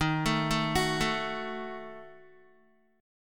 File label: Eb Diminished, Guitar; Ebdim arpeggiated; 10,12,9,11,9,x; JGuitar